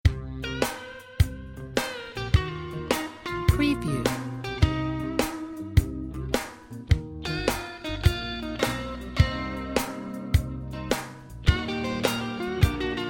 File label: Blues Guitar Swing